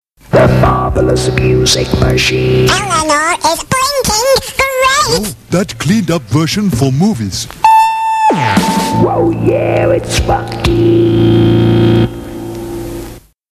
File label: Jingles